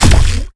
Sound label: throw leer; Valorant; reyna